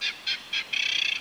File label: one bird